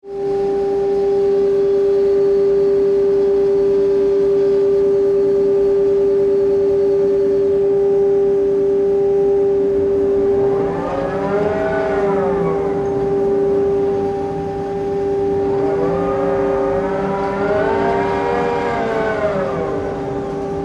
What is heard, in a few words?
Ship Oil Pipeline Service Vessel Crane Working